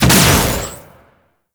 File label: Shot, Spear; Warframe; Tenno; Priest Spear; Scourge Prime